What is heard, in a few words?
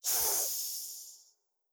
Scarab Scream; Legend of Keepers (gamerip) (2019)